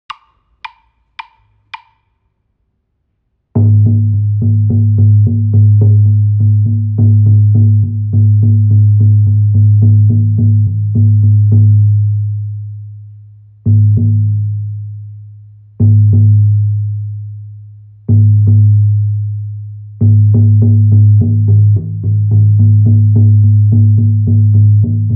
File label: Drum Ovaal 50cm; KoeSalamanders